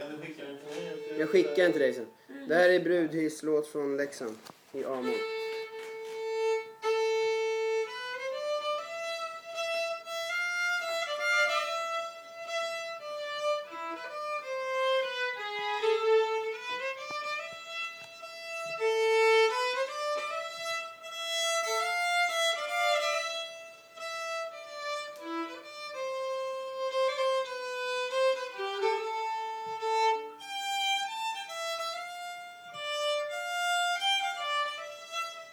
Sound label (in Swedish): Brudhisslat leksand